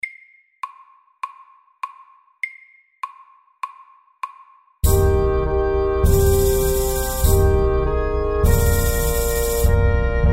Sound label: MEDIUM 100